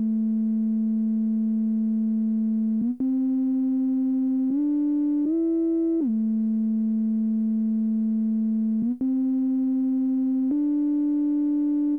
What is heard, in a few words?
syn 80 Dmin; ProSamples vol.34 - Hip Hop & RnB 3; Got Rhodes